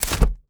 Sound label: Umbrella Open